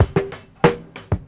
Boombap drum loop

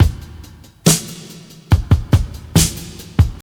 Drum loops Boombap

